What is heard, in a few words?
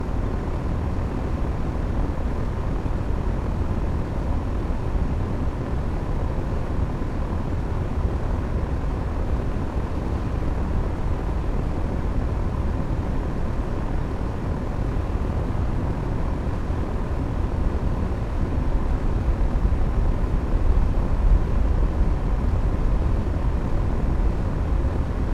Brown Noise Air Conditioner